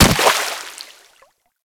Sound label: Infested Runner Step Water; Warframe